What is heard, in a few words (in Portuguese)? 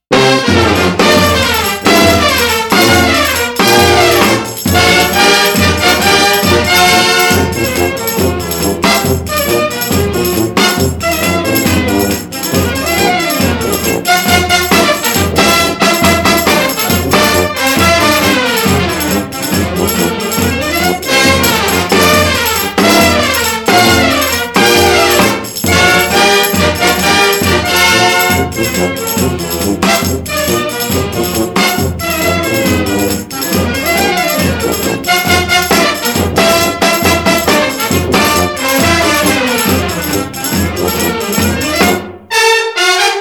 Banda Municipal